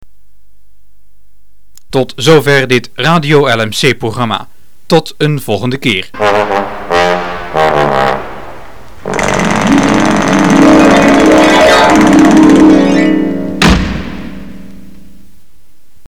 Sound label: jingle